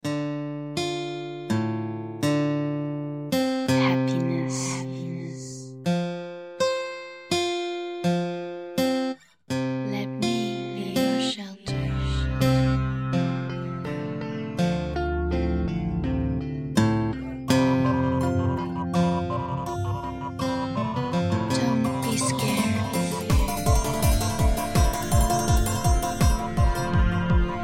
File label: melody of sound